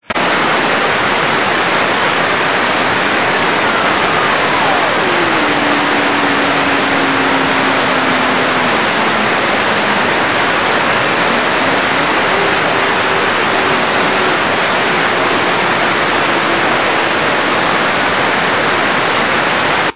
in orbit cw; UNITEC-1; ja4blc

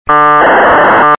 manchester

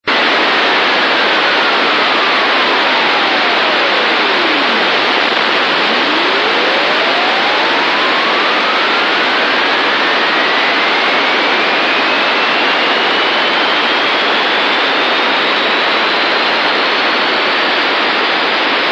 proba v 2235MHz

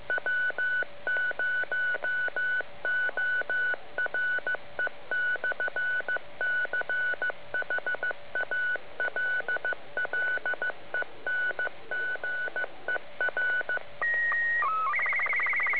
cw id for sstv transmission 2m downlink; challenger

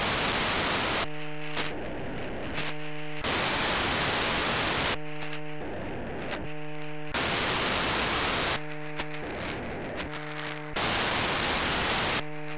437 MHz 1k2 FSK beacon, satellite; UPMSat-2; 437MHz405; On October 22nd 2022 at 10:22 UTC Daniel DL7NDR received on 437.405 MHz the 1200bd FSK bea

